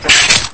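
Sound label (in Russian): треск сломанного предмета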